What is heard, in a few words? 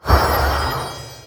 Spell Small Positive